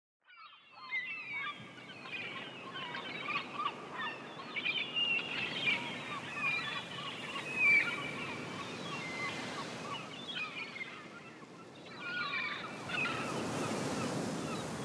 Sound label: Waves